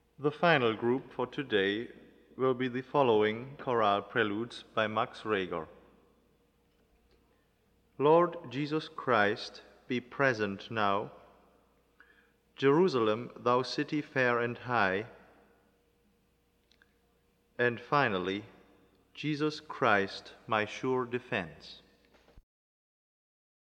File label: Chorale Preludes; Reger; 30 Short Chorale Preludes; 11 Lord Jesus Christ Be Present Now